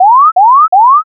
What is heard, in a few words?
scan